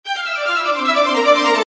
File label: Alert; Stoneheart